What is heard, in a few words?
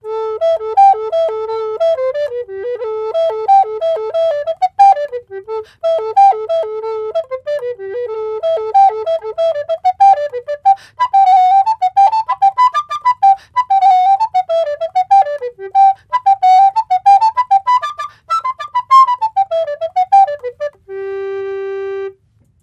Yamaha YRA402 B Ecodear Alto; Yamaha YRodear Alto